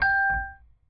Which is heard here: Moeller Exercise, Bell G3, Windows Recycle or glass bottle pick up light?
Bell G3